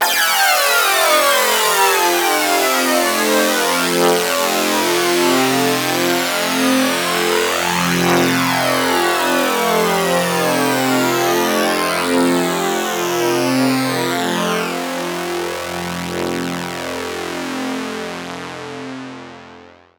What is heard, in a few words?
Trance Sweeps; Vengeance Samples; VEC2 FX